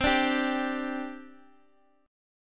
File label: C Suspended 2nd, C, down, chord